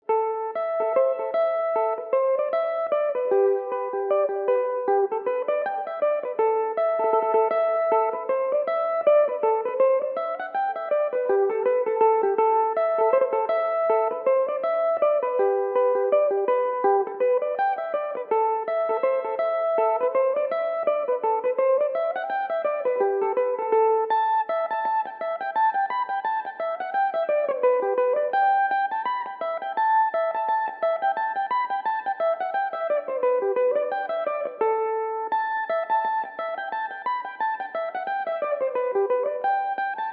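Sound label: A dorian, irish mandolin, tunes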